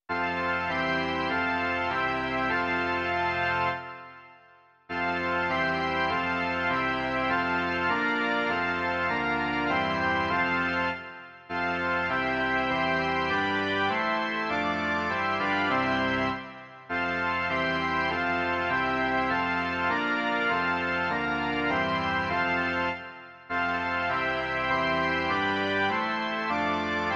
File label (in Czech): varhany